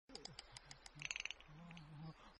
rattle; kodama

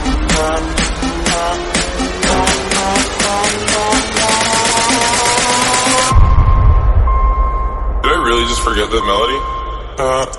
attitude entry song

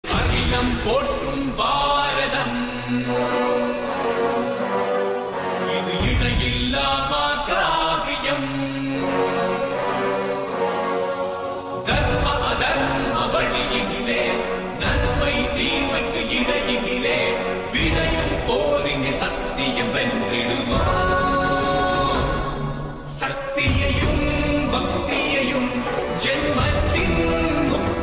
song in tamil

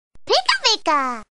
message tone; pika pika

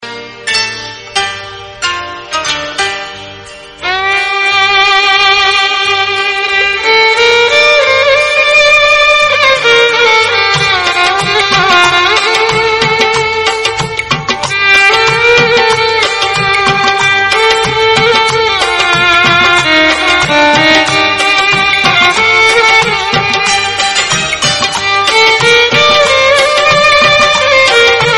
Instrumental

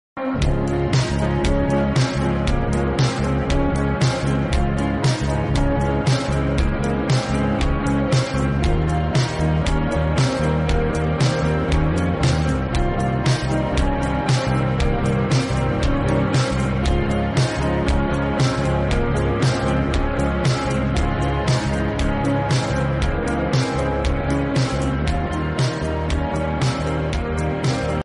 instrumental bgm

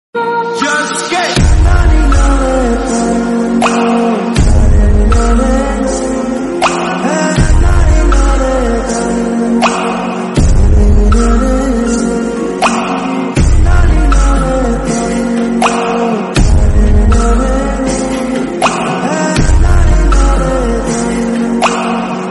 background music, instrumental

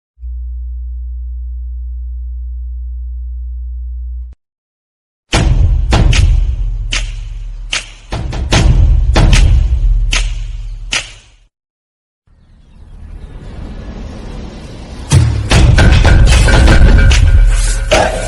water fight bgm

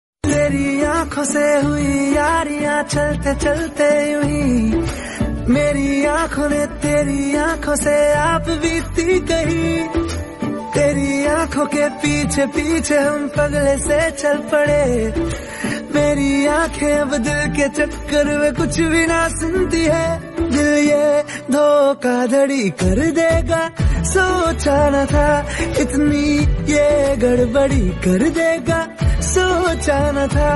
lofi mix hindi song

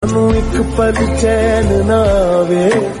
notification

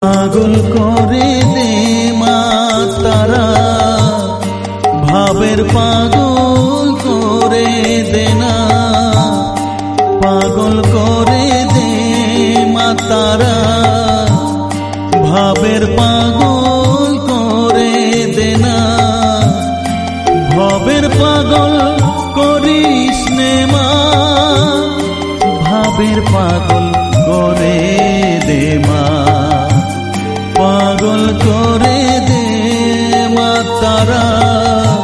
bengali devotional; tara maa bhajan

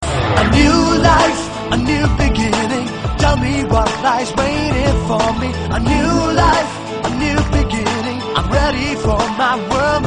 slow

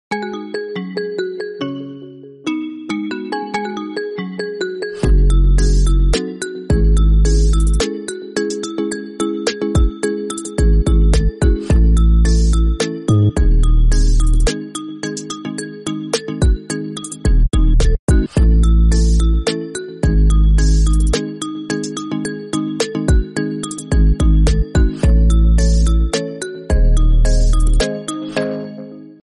intro bgm; loki

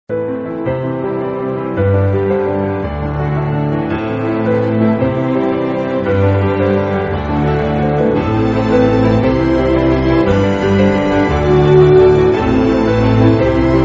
climax bgm; bangalore days

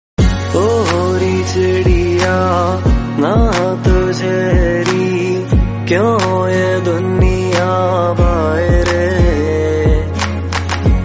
lofi song

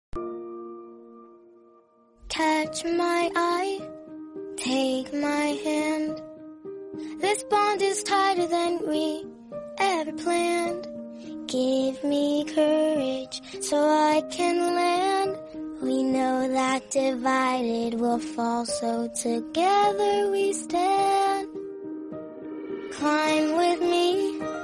english song

